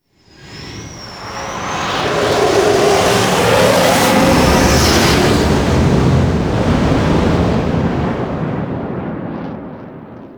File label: Gas City Space Fighter Approach; Warframe; Corpus